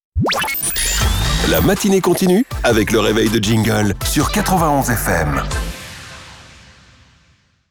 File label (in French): Sweep; 91 FM; Reveil de Jingle